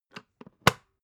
water boiler close top

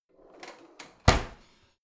old drawer creaky slide in

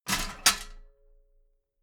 metal ladder small handle unfold heavy

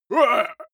male shout hit short

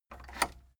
cassette eject push

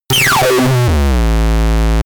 sliding low rumble

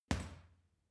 boxing punching bag uppercut soft

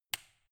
switch turn off

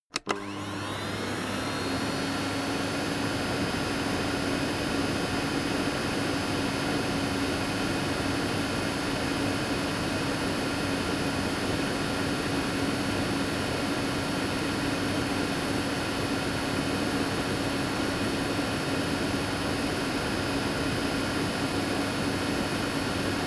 vacuum low

